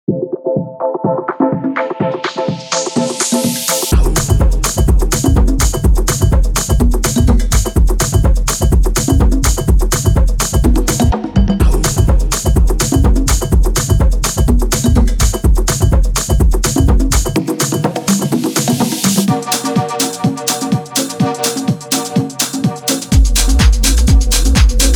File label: Percussive Tech House; Toolroom